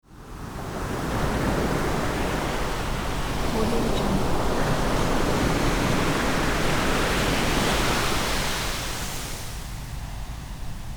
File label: waves pack